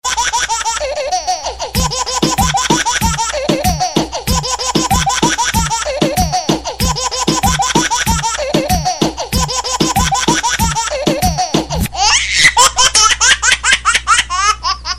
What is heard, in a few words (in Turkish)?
gulen cocuk